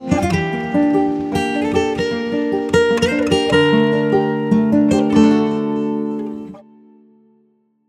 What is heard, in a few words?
catch; meteor world actor